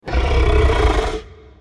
growl, trex; Crossy Road; dinosaurs

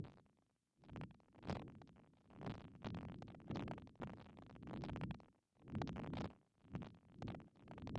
fire flickering; adarkroom

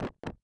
eat meat; adarkroom

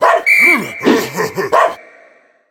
guard catch; subway-surfers-ny

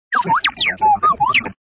Robot Warn; Bob the Robber 2

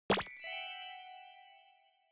snd super; Slope

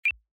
beep; fridaynightfunkin; flixel

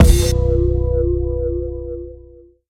game Over End, music; fridaynightfunkin; gameplay; pico

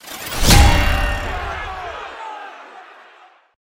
loss; fridaynightfunkin; ranks

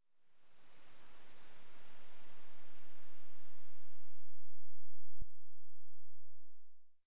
landmark destroyed village; A Dark Room

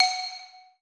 phone; thirtydollarwebsite; robtop

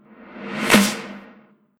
pre echo; thirtydollarwebsite

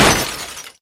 break; thereisnogame

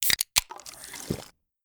Drink; bobtherobber2

